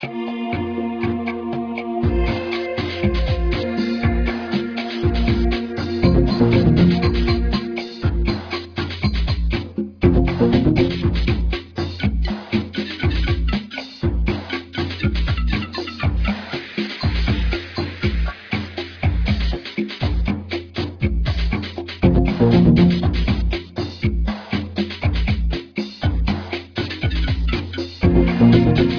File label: techno bahia